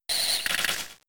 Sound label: Spike Cannon 1hit; Pokemon; Gen 3; attack moves; RSE